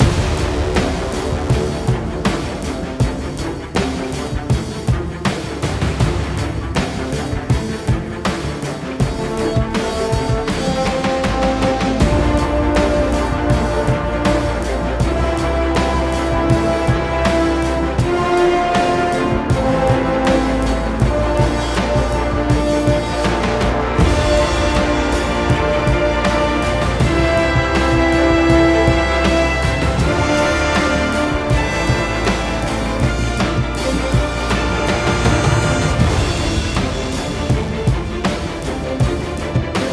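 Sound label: Music Orchestral, Orchestral Pre Race 80bpm; Forza Motorsport 6 Apex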